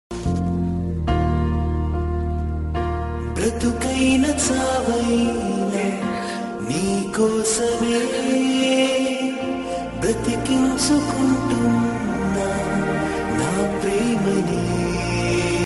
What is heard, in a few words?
huawei p9 ringtone